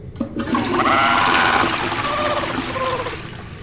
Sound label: flushed